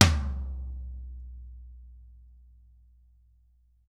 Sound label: DW HIT, Drums; ILIO; Double Platinum Drums 1; DW+DW